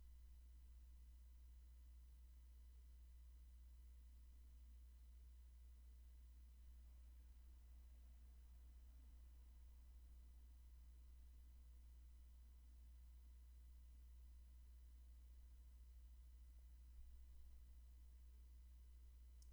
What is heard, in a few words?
room tone